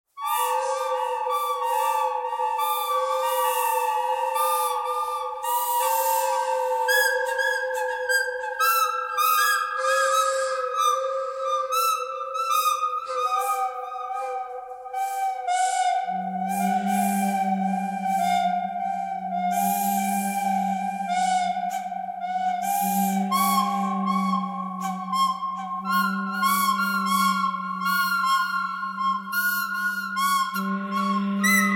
bird canyon harmonic

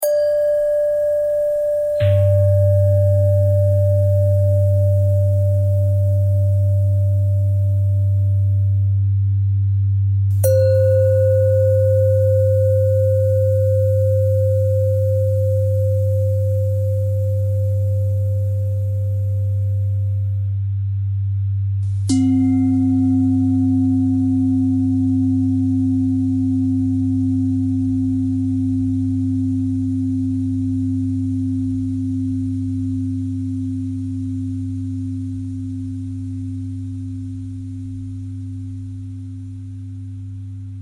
bell bell thread simple, bells

